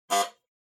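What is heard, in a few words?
Doorbell Mechanical Buzzer Close 50Hz; ドアベル 機械式 ブザー; 近い 50Hz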